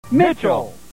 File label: yell; MST3K; Mitchell